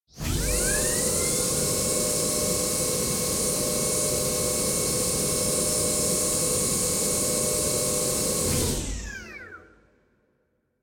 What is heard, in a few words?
Elevator Or Horizontal Conveyor Designed Full Reverb